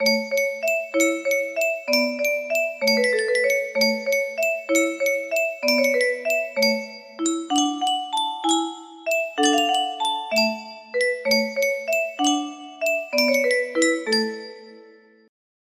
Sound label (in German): yunsheng spieluhr